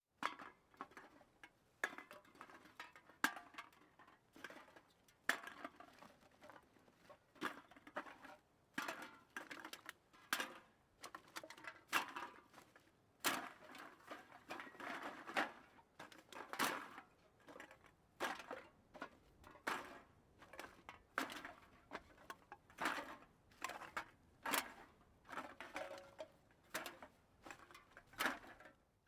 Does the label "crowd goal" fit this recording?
No